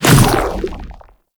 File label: Shadow Mace Whoosh; Warframe; Weapons; Shadowgrapher